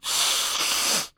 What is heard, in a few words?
BLOWING; persone